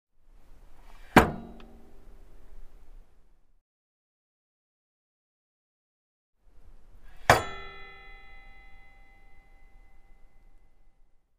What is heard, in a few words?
Motorcycle is put on center stand